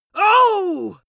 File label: pain compassion male